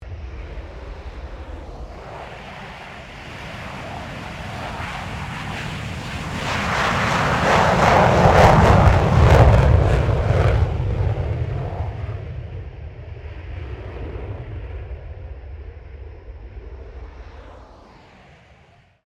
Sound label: AIRCRAFT FA-18 HORNET TAKEOFF STEREO; RAAF FA-18 Hornet; Airplane; Vehicles; Aircraft Collection